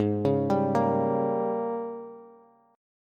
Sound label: Ab Major 7th Suspended 2nd Suspended 4th Ab, down, Bass; arpeggiated; JGuitar